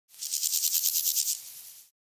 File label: Grasshopper grinding